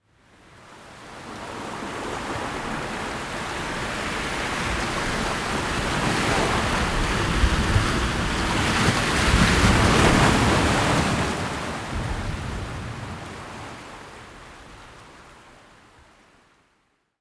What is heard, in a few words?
shoal